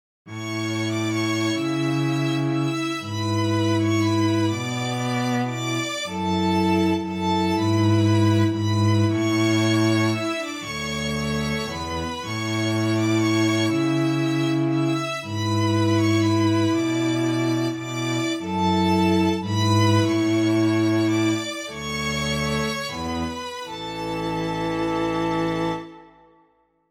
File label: yamaha tyros5 ensemble open quartet